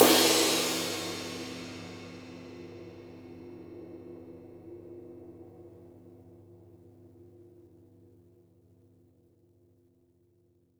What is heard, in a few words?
flat ride - crash; JazzFunkKit